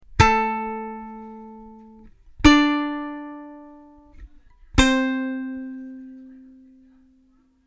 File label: octaves, ukulele; An octave is a grip (chord shape) of two notes. A main note, and the note an octave above